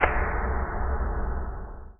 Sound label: Sound punch intro; Spider-Man CD Cardz Green Goblin